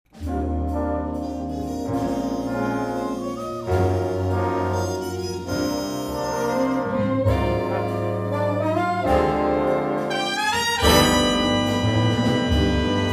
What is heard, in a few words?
dolphin dance